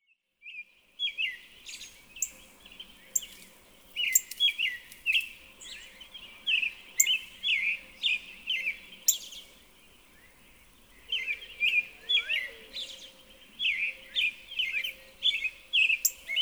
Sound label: Northern Cardinal